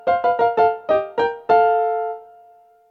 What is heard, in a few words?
level 1